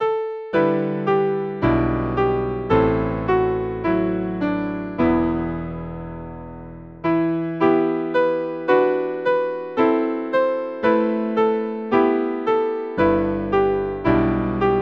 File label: Hymn; Hymnal 1982